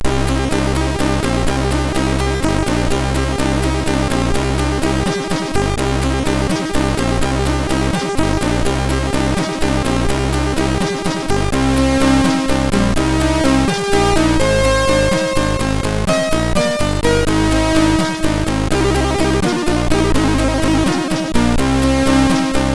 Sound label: Langsam, MOS6581R4